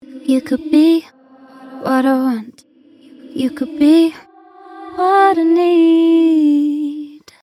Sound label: reverse reverb; 人声; 反转、混响，再反转; Waves